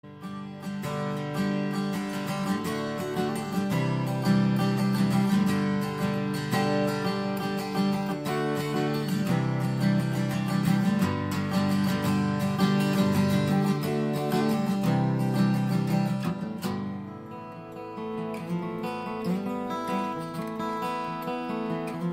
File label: Seagull Mosaic Acoustic Guitar; Seagull Artist Mosaic Acoustic Guitar